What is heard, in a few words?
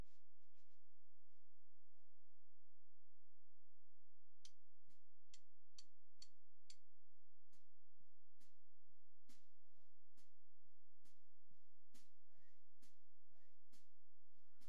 Snare under; Pro Tools